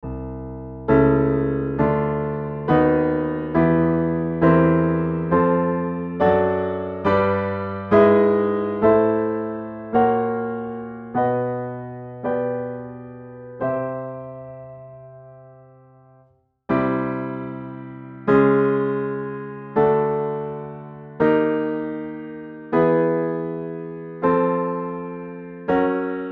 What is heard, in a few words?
Pythagorean Chromatic